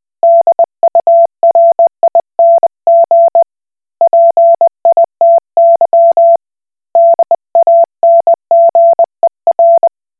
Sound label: Koch Morse